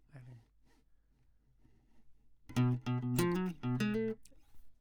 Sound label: Classic Guitar